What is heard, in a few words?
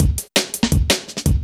Flip Break, breakcore